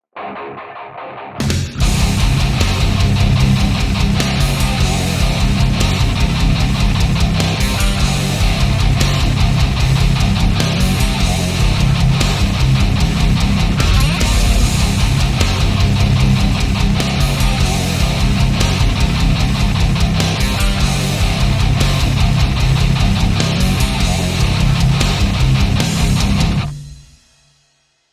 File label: Guitar tone testing; Bronyfied